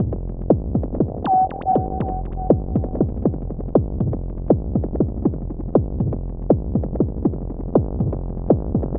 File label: eurorack